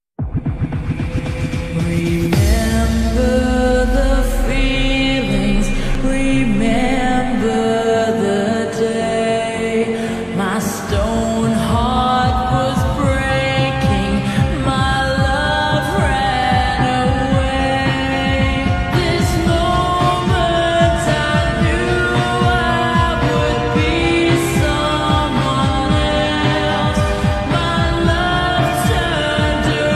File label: slowed reverb